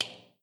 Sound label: metal ladder footstep; adams venture origins